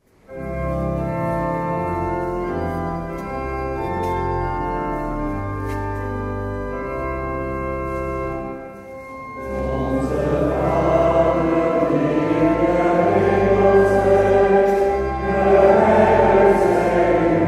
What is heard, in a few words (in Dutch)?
Onze Vader; 140608Pinksteren